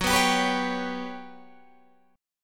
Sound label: G Major Sharp 11th F sharp, chord